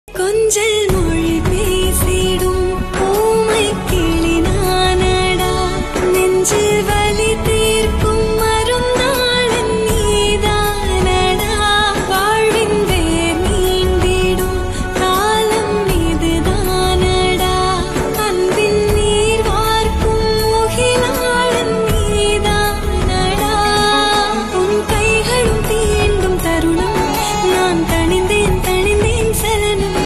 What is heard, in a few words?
tamil ringtone